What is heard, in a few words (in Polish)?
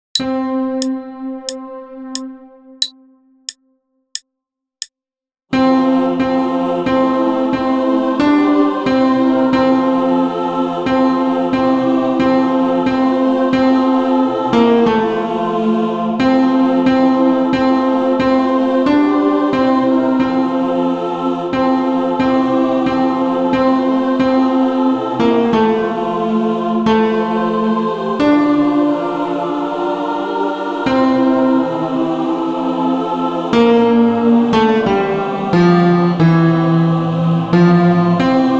TENOR, chor; Nad Betlejem; nad betlejem w ciemna noc; lira z pruszkowa